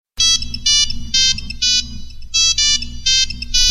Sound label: Nokia Tick, Ringtone